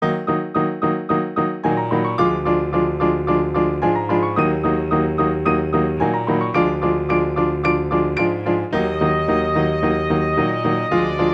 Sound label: Fe Fi Fo Practice Tempo B flat